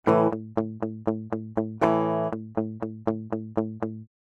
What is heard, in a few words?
Guitar